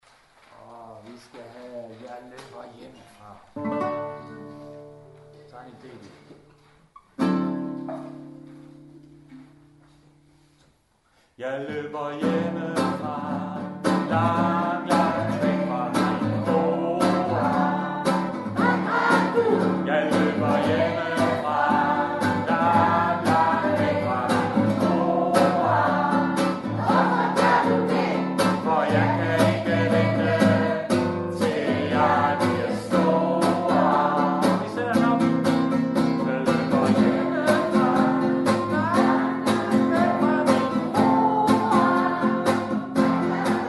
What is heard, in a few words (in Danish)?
Sang